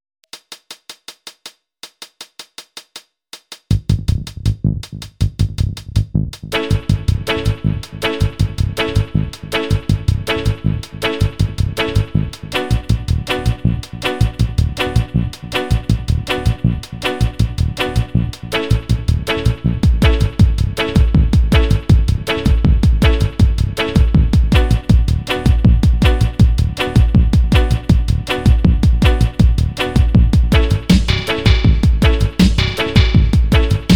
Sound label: Dub